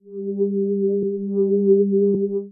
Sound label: fx synth